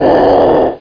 CG PAIN

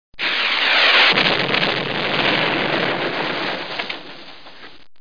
rocket; How Many Bugs in a Box; juicebar

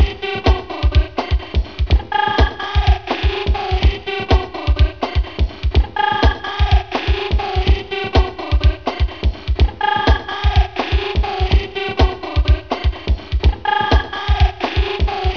CHINES

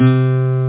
accgit; da capo vol1; guitar

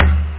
bongo; da capo vol1; drums nature

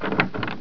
DOOR

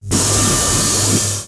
knife

